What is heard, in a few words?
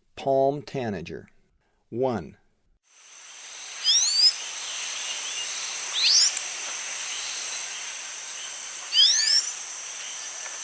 Palm Tanager; S. America; Eastern Lowlands